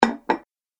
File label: Heavy Pasta Bowl Placed On Table; Dishware Sound Effects; Household